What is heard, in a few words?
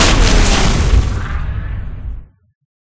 Magic; life of a naomi; Naomi Carrigan